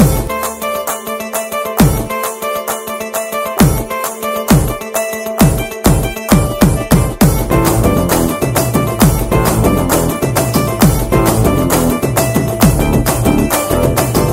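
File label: background music Telugu Ringtone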